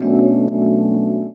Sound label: Chords Tones; Equipped Music; Loungin House